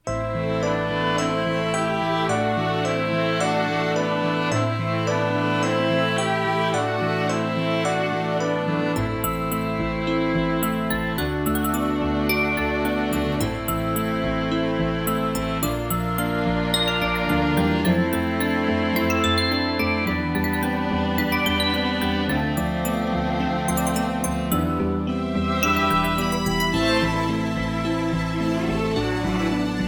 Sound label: dungeon water ice; Town of Night